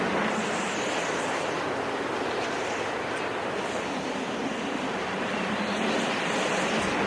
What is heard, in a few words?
Ventil Wind; Puddle; puddle plus ios gamerip 2018